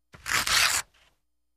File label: Paper Rip Longer; paper mvmt